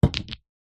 sport snooker pool junior cue strike cue ball hit several others; zapsplat